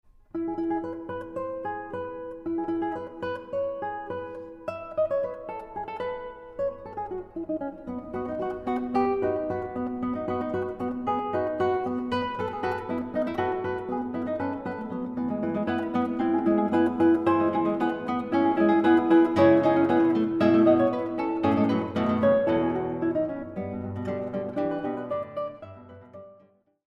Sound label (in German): Gitarren Duo